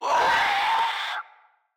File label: Infested Crawler Attack Short; Crawlers